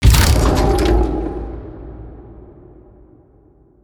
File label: wheel start; ui; economy